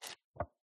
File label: Book, Paper, Pages, Flip Through; PMSFX